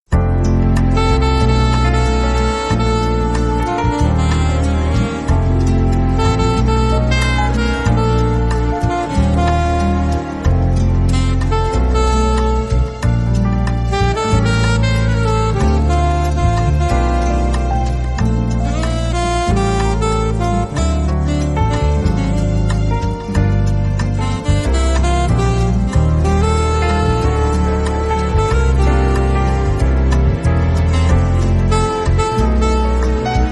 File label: Bossa Version